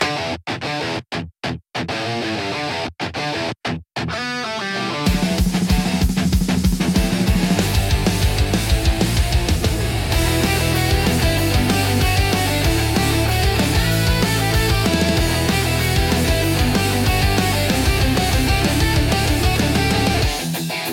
adrenaline rush rock a high energy sport theme; action

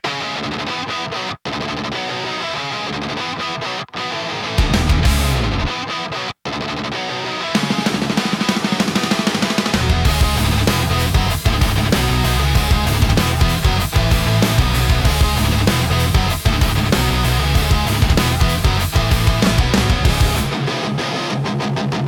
asphalt rebellion a gritty action rock soundtrack; skate-board; Skateline Fury

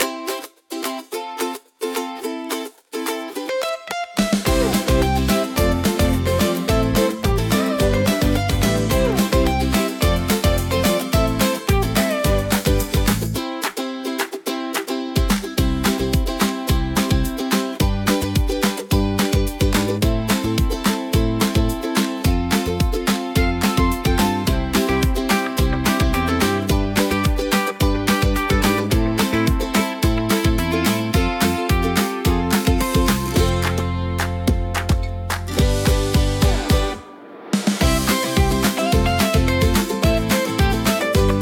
a cheerful background; good day spark; pop-and-happy

